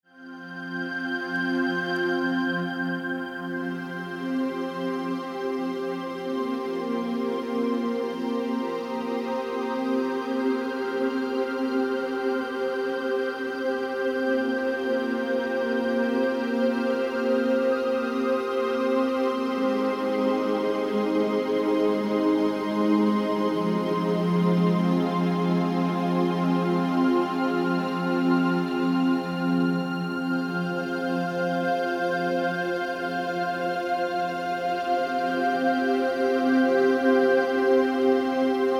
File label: Space Sounds Music